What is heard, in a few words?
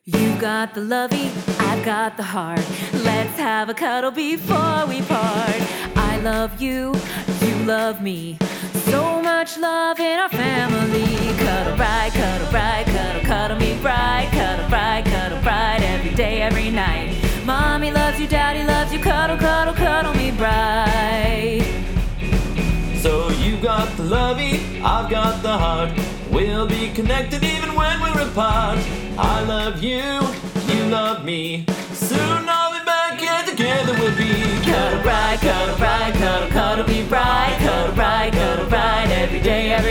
Rockabilly